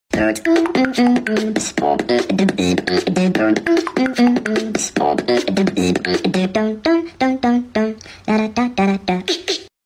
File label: beatbox